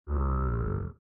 Ryk smoka wypluwanie

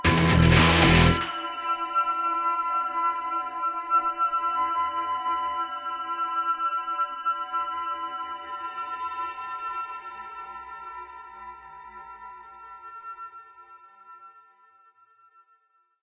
Walka Lekki; Shadow Warrior 2 gamerip 2016

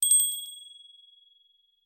chwycenie sakwy

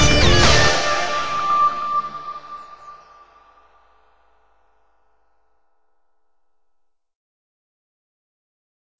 Walka Mocny; Shadow Warrior 2 gamerip 2016

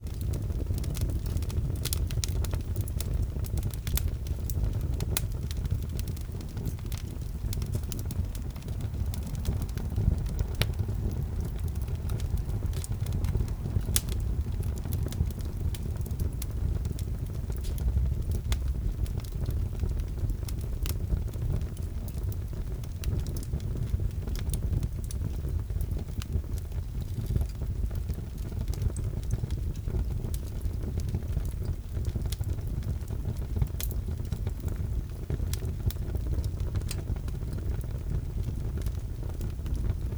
ognisko

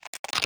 kosci

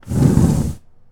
przestawianie kosza

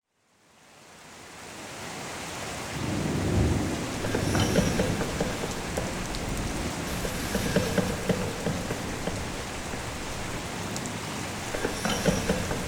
podklad (deszcz, szumy ,dzwig); apocalipsis

